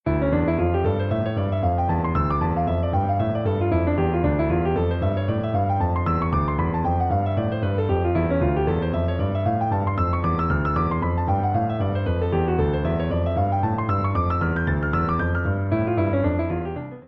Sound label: minor scale, piano